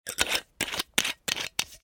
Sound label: BOTTLE GLASS